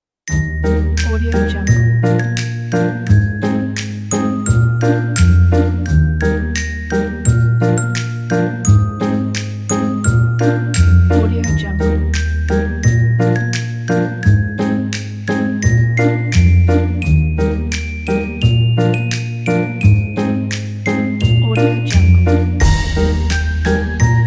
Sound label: winter dance